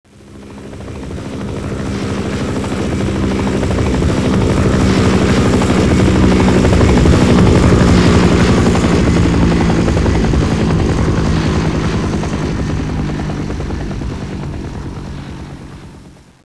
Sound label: osprey fly by; ambience